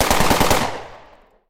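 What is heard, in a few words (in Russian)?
выстрел из винтовки АК 47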